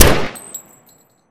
uzi shoot; Tacz1.1.5+; RSWS 1903

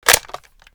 ak47 reload mag in; Tacz1.1.5+; RSWS 1903

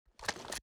ak47 reload; Tacz1.1.5+; RSWS 1903

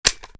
ak47 reload empty mag in; Tacz1.1.5+; RSWS 1903

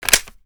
ak47 inspect mag in; Tacz1.1.5+; RSWS 1903

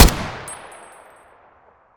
ak47 shoot; Tacz1.1.5+; RSWS 1903

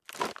m95 raise arm; Tacz1.1.5+; RSWS 1903

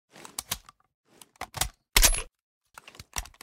mp7 reload empty; Tacz1.1.5+; RSWS 1903

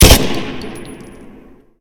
mg42 shoot; Tacz1.1.5+; RSWS 1903

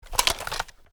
ak47 inspect up; Tacz1.1.5+; RSWS 1903

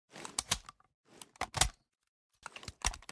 uzi reload empty; Tacz1.1.5+; RSWS 1903

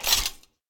g2 reload; Tacz1.1.5+; RSWS 1903

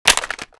ak47 reload mag out; Tacz1.1.5+; RSWS 1903

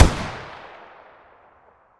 ak47 shoot 3p; Tacz1.1.5+; RSWS 1903

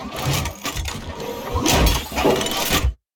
40mm reload; Tacz1.1.5+; RSWS 1903

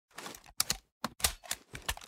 uzi reload tactical, mpx; Tacz1.1.5+; RSWS 1903

